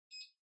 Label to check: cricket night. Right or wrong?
Wrong